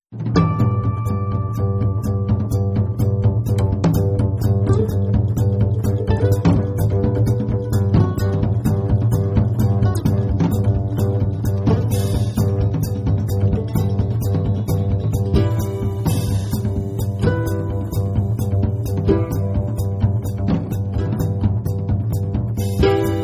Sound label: groove